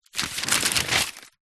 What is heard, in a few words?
household book telephone directory page thin turn